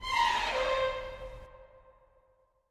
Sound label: gliss